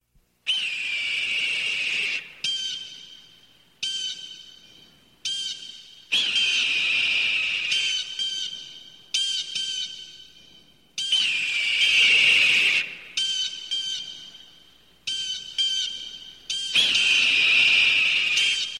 Bird Eagle 3D

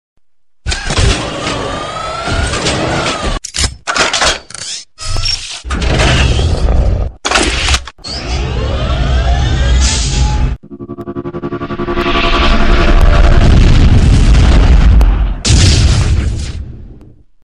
Mech; Compilation

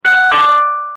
Echoing Doorbell

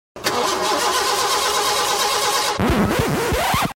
Start The Car Sound

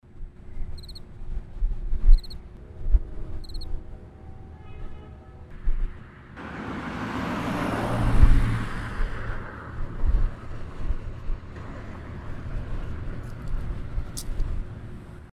Kricket

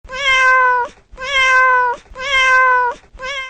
Cute Kittens

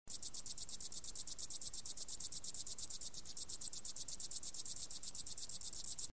Singing Insect Sounds

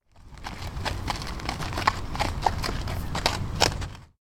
Horse Walking; Axiyee; Redbarn

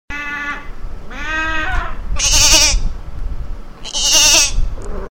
Goat Goat

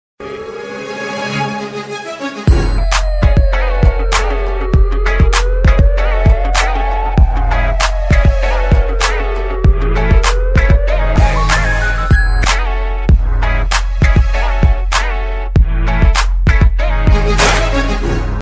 Siren Hip Hop

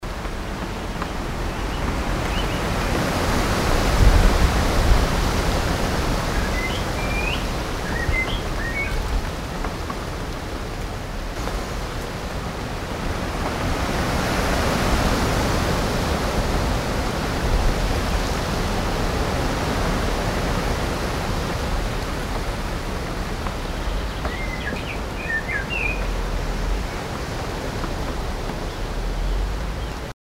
Wind And Wing

